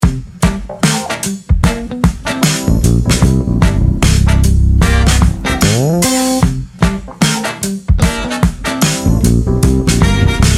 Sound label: Funk, bass; groove